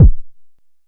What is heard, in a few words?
analog quick, Kickbank